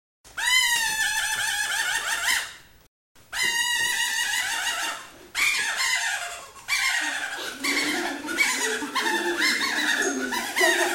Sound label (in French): Rire feuille de lierre